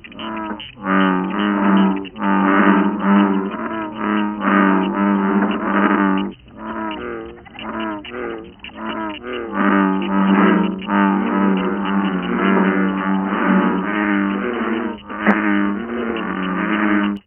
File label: bee